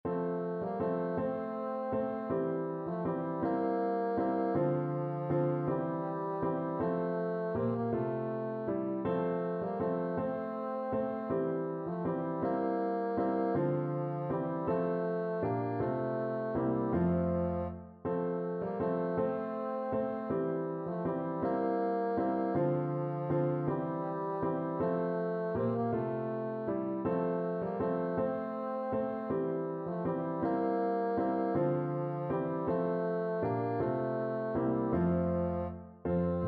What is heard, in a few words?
mozart sonata 11 1st k331, bassoon